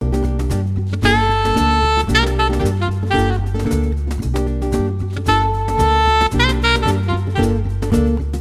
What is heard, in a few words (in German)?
Klingelton; Salon du Jazz